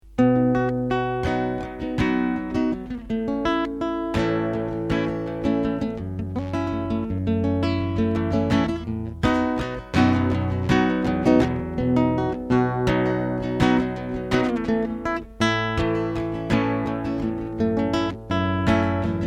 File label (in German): Mandoline